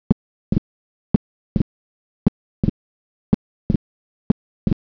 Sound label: splits2, zvuky srdce